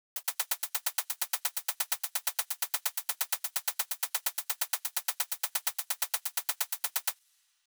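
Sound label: Drum Loop 128BPM hi-hat 16tel; VEC5 Drumloops; VEC5 Multi Loops 128BPM; Loop Elements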